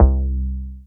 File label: BASS MONO